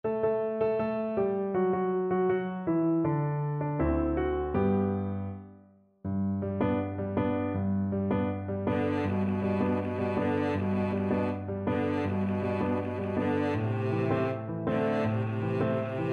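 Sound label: violoncello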